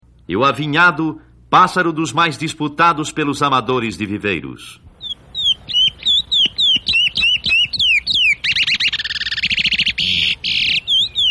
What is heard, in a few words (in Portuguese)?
canto, aves; johan dalgas frisch; cantos de aves